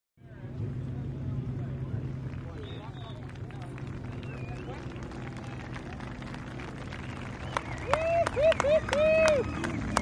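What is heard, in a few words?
Motorcycle Finish Amb, road racing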